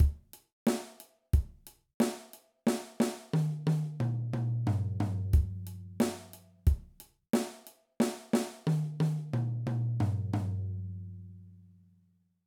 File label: Basic Fill